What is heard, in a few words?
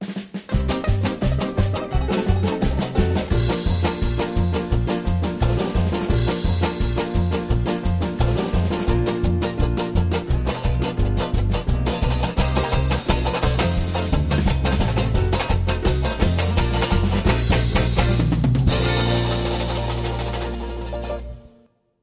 Short Banjo Underscore